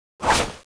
slash, knifes; cstrike; strider

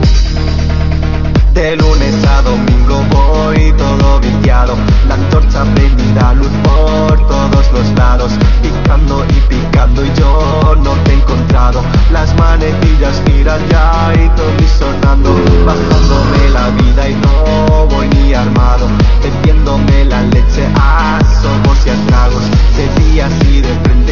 nether music; jail nether fortress; cstrike

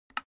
roulette start; jb minecraft xd2ru

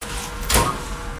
space station button; ze space station pg

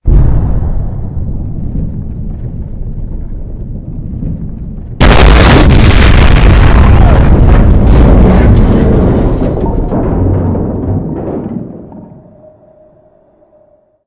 Nuke; bog nomercy

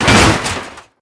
bump soft, car

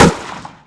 p90; cstrike; ZP CRISTIAN; ZP WEAPONS

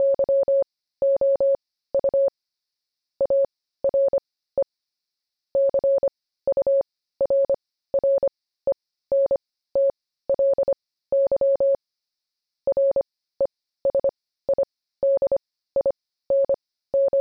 morse; jb recaptured; cstrike

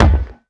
ball impact ground

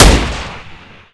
sound xm1014; cstrike; ZP CRISTIAN; ZP WEAPONS

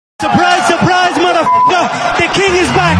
surprise; cstrike

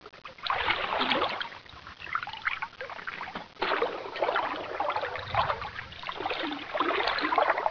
water; jb nuke csbr; cstrike

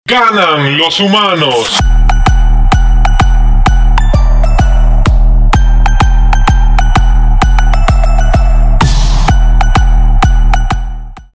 humanwin xmas

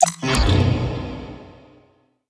fail; cstrike; trading

